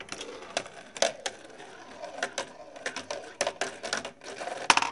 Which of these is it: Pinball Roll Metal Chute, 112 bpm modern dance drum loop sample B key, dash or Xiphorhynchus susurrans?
Pinball Roll Metal Chute